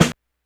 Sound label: snare, Hardcore Breakbeat; Big Fish Audio; Hardcore Breakbeat Action; Rhythms 4 Life 151 Ebmin